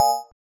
BELL; osu

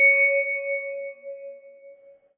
vibraphone C#6

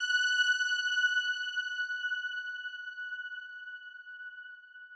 Fir F#7

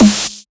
hit; osu

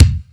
drum hit normal

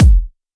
soft hit whistle

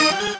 normal hit normal

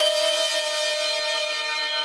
synth main

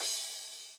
cymbals

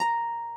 harp

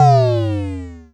Formant Drop Short

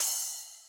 splash; osu

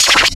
spinner spin

